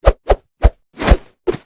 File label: punches